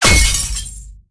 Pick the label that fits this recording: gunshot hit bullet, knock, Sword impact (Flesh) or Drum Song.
gunshot hit bullet